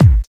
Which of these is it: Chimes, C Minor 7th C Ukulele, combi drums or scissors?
combi drums